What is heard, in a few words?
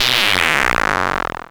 Synth Buzz C, Basses; Total Drum&Bass